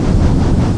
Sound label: fan; svencoop